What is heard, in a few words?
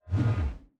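infantry mace, weapon; Battle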